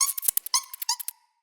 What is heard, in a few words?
Misc Squeaks, Animals, Vocals; Floppet